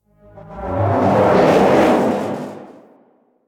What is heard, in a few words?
Teralyst Whoosh Step; Enemies; Steps; Warframe; Eidolon